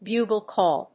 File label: bugle call